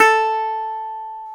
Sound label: GTR, Dobros, National